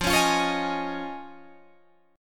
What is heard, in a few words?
F Minor 7th Sharp 5th, Guitar, DADGAD